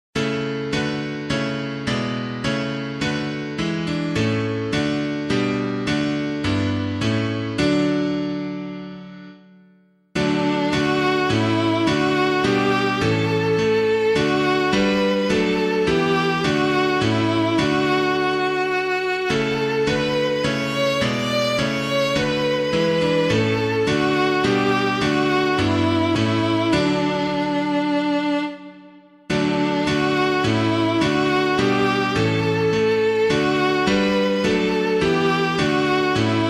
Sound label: piano